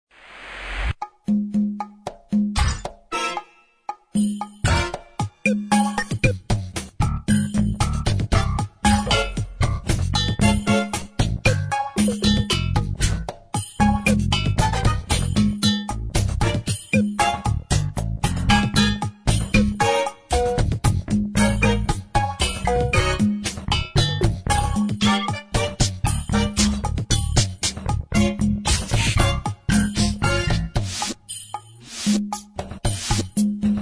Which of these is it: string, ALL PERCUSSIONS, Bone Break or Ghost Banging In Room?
ALL PERCUSSIONS